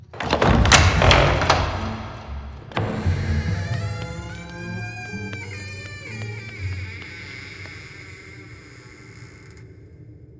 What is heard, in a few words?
intro door opening creaky; We Were Here Forever